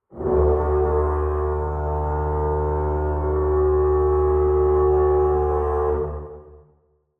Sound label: Cinematic Horn; Mechanical Wave; Cinematic Feel